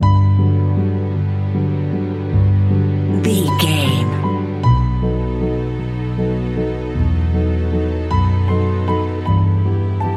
romance and longing piano strings double bass

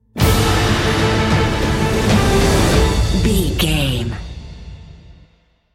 epic cinematic sting bumper

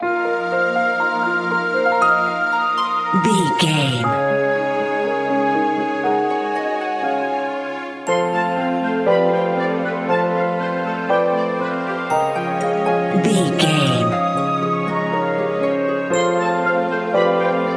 enchanted mystical forest

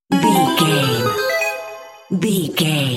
hidden item revealed video game

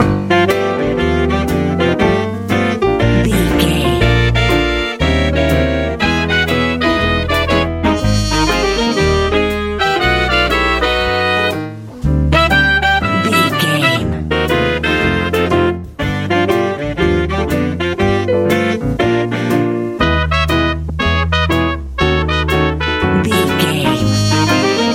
powerful good easy jazz

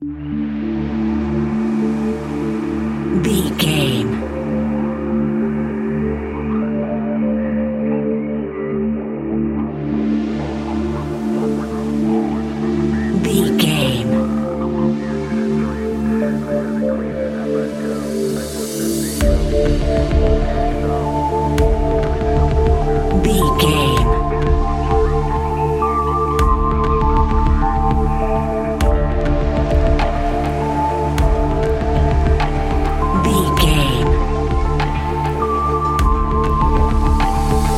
deep intelligent electronic game music

